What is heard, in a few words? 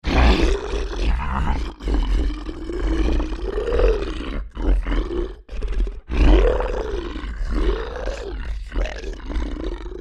Creature Large Growls Attack; Monsters; Horror; Big Room Sound